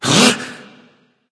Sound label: surprise; smite; agni